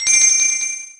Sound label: Attack FX sound effect